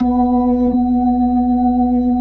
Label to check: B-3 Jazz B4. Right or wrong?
Right